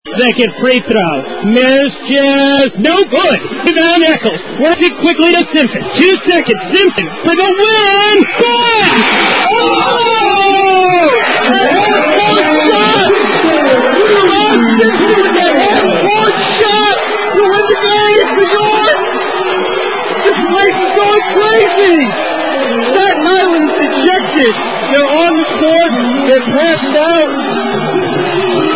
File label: buzzer beater; cuny